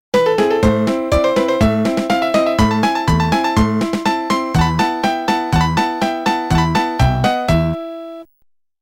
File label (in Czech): melodie